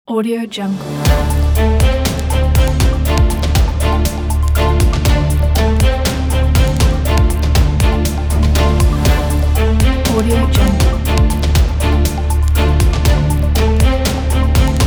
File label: hot news trailer